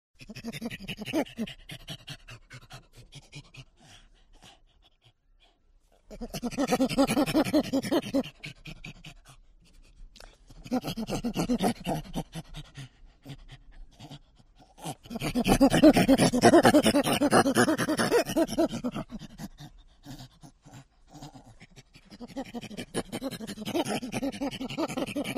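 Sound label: CHIMP GRUNT close up HOARSE RAPID PANTING; wildlife sounds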